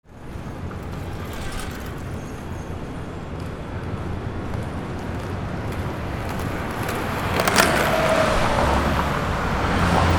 AMBIENCE

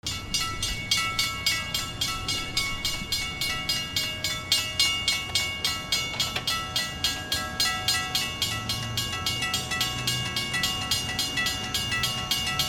TRAINS

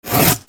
RIPPING

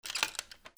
CLICKS

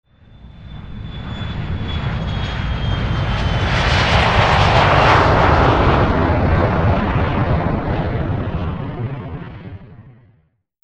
flight

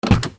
CARS

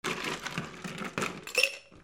KITCHEN

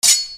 WEAPONS

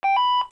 INDUSTRY

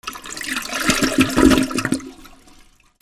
BATHROOM

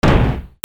BREAKING

